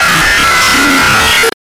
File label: jumpscare sound; the escape from scars 3